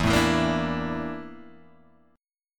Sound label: A sharp Major 7th Flat 5th E